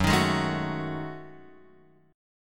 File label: Gb Minor 6th Add 9th Gb Guitar Open F; strummed; x,1,3,3,0,1; JGuitar